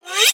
cartoon ascend fast slide

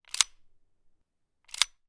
gun 1911; weapon; still north media

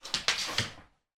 dog labrador walk slide wood floor

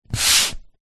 paper sheet a4 push by hand on table; zapsplat

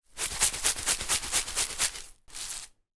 food seasoning large pot shake onto food

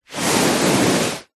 foley hand on carpet single rub

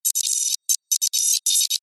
science fiction data read out print screen

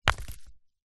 small stone drop forest floor; zapsplat; foley

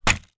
leisure toy rc car land on wheels; zapsplat

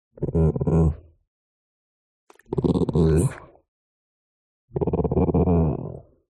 DOG Chihuahua Growl Muffled; ANIMAL; smartsound

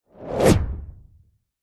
whoosh fast powerful

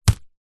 stone med drop on dirt; zapsplat; foley

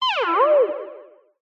synth space weird synthy sci fi sting accent; Jessey Drake

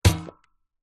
leisure toy plastic gun shoot dry pop click

